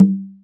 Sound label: conga batter; Best Service ProSamples vol.55 - Retro Sampler; AKAI